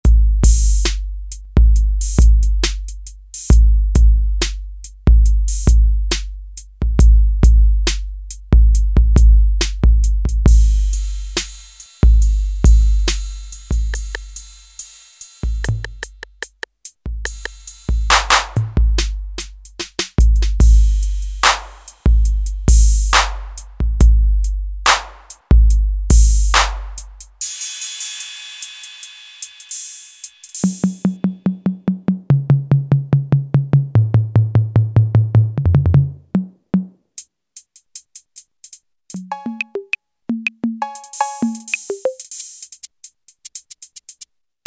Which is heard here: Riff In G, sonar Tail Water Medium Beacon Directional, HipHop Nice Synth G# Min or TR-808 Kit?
TR-808 Kit